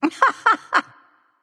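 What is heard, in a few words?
heh heh, female; smite; voicepack; hera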